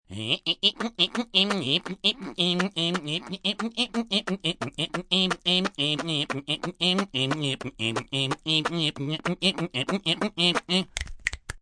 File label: Singing, Singing, male, song